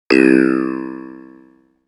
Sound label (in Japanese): hanekaer